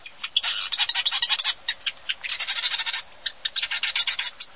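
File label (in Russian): неугомонное щебетание вьюрка во время брачного периода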